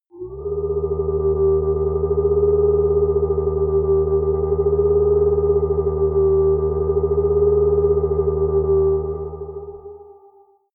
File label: floating ufo